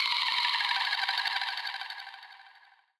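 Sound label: guitar DRIP FX; Rawcutz - Nu Forms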